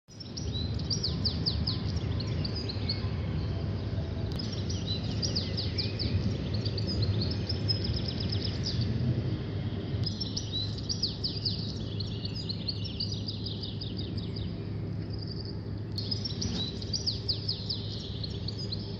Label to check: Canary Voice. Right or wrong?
Right